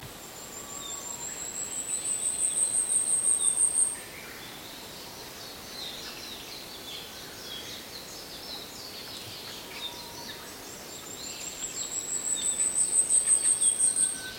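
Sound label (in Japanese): yabusame, Asian stubtail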